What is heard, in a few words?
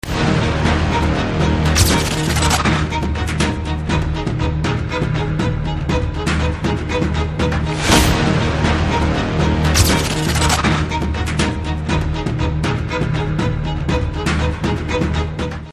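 Trailer background music Ringtone; Rakshasudu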